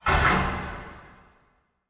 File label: industrial door heavy large close reverb; zapsplat